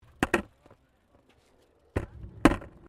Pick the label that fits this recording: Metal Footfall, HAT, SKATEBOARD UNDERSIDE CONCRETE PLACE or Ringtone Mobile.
SKATEBOARD UNDERSIDE CONCRETE PLACE